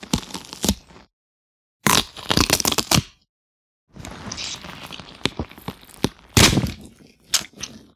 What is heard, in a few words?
Crack and hatch